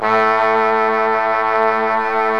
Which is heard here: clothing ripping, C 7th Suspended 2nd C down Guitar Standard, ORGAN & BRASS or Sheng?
ORGAN & BRASS